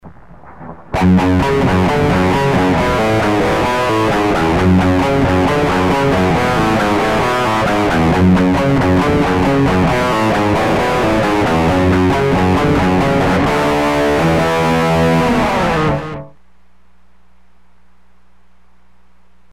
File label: Lead Intro